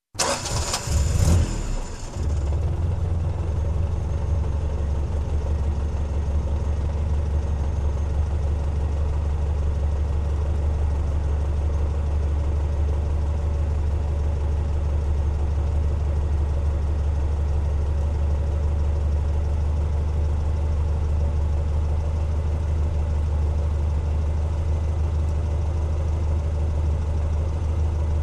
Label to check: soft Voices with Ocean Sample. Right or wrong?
Wrong